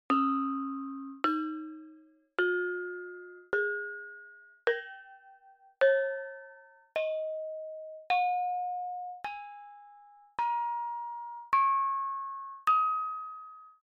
bonang barung slendro

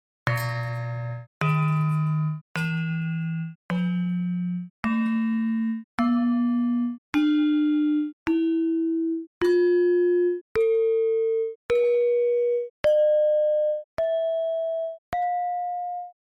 gender barung pelog

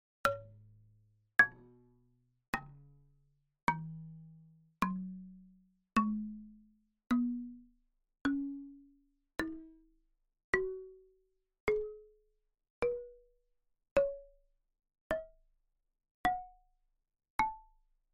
gambang slendro

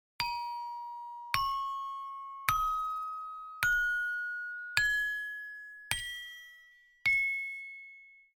saron peking slendro

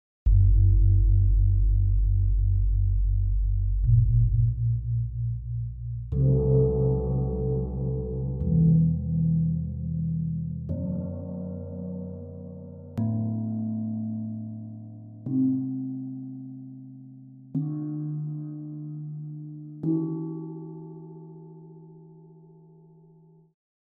gong kempul pelog

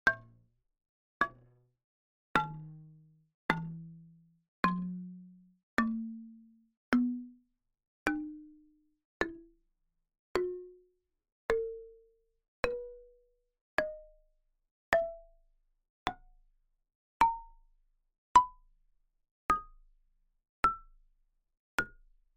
gambang pelog

